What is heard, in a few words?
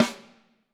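snare drum, Drums; Bob Clearmountain Drums I; SD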